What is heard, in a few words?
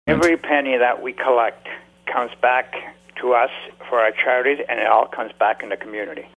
Falsetto